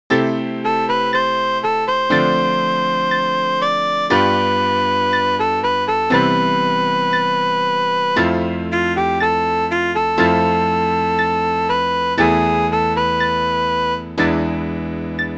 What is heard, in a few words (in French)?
un beau roman melo metronome harmo